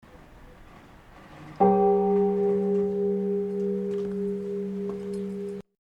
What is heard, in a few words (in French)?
cloche; Coustouges, cloche 1